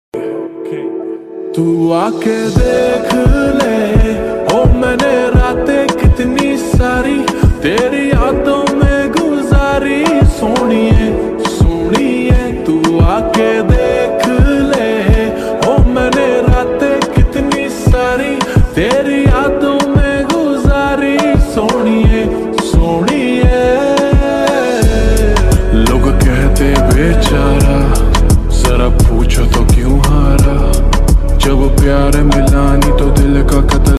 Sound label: lofi ringtone hindi love song lofi ringtone